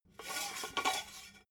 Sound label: metal scaffold pole slide; industrie-wirtschaft